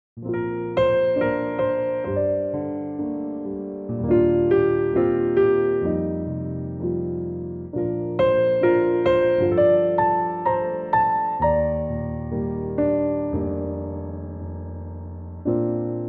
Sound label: Bb piano; key - Bb - vocal range - Bb to D; classy piano only arrangement